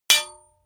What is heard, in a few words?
hammer hit metal